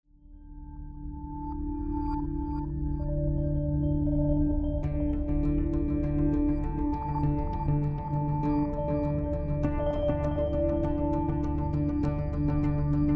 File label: Rock Intro Stinger Long